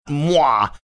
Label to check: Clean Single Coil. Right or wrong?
Wrong